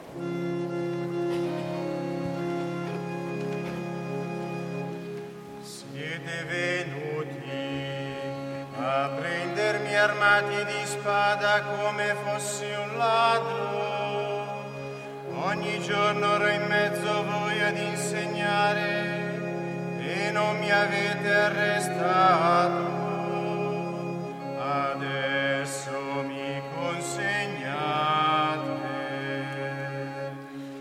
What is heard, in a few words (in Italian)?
Responsorio